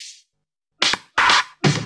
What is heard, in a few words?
Fill Ins 128BPM; Vengeance Samples